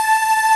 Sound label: Ending loop